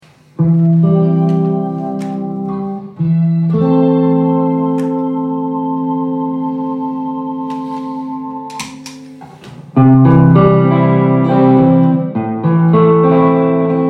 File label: ehx test